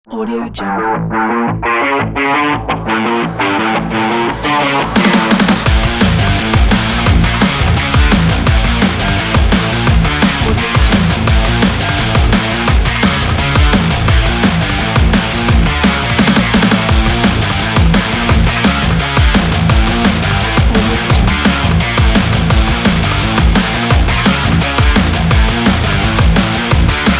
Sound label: sport ident